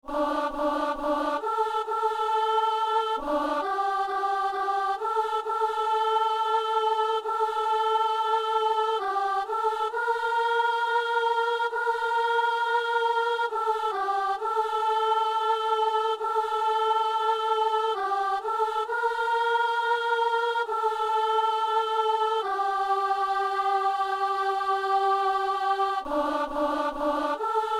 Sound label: soprano; Psaume 101 (102); Messe du 17 janvier à Saint-Denis